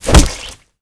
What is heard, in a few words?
foot kick head; Garrys Mod